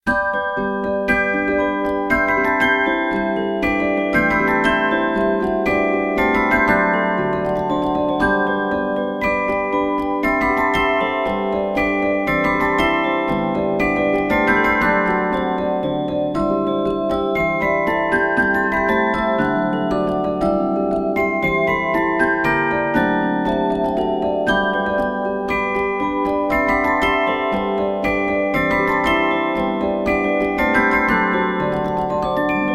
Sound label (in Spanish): Music Machine 2 cilindro izquierdo